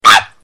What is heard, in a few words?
bark